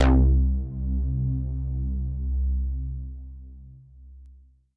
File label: funk Bite Bass C2; Efunk